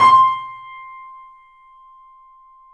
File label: C, The Piano; InVision Interactive - The Piano